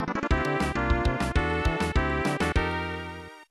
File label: Unused Jingle; Super Play Action Football